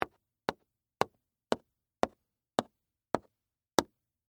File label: Game Piece Move, Sports, Board, Game, Metal, Piece, Move, Multiple, Times, On, Game, Board; Monopoly; BlastWave